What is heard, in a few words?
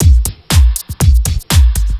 4 floor beat, trance